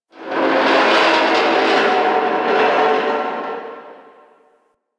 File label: Asteroid Field Ship Metal Creak C; Warframe; Ambience; The War Within